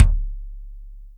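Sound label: kick, Boss DR660; drum machine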